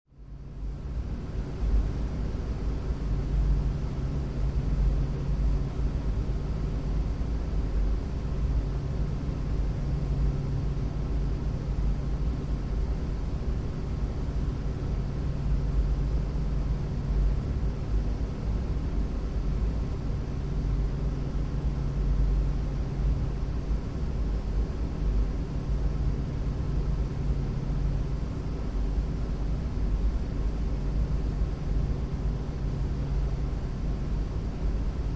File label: Arena Corridor Venti, Large, Airy Lobby Area With Very Light Movement